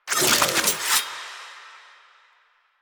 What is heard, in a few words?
Finisher Parazon Unequip; Kuva Lich; Warframe; Finishers